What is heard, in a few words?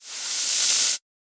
snake hiss; soundscrate